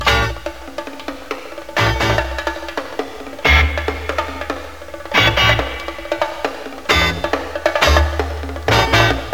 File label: beginning drums; ringtone; ren stimpy